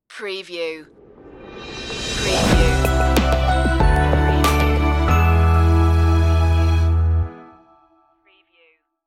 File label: relax ident